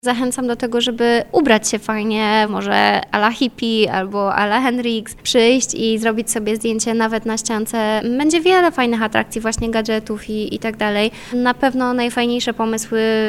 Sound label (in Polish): news casting gitarowy